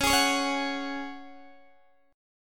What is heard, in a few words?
Db Suspended 4th, Guitar, Open D